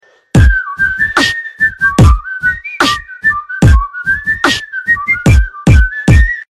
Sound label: beatbox